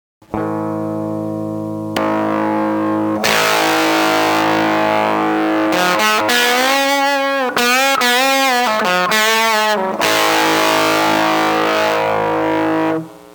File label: fuzz; electra